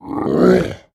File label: Arcade grunt